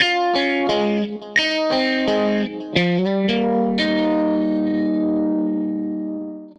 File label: Tele Guitar Loop